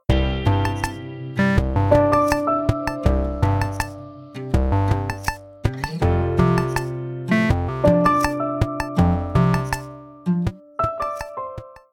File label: bg music short